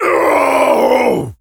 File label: pain major; Duke Nukem; Forever 2001